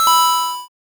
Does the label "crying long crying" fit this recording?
No